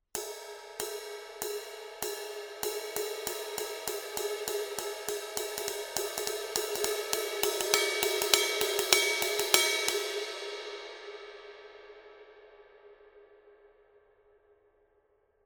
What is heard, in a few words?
masters 18 extra thin pattern, paiste